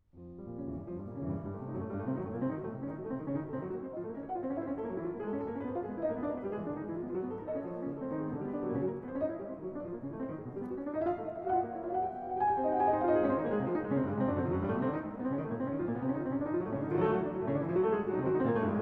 Sonata No. 2 in B-Flat Minor, Funeral March Finale Presto; Chopin; Op. 35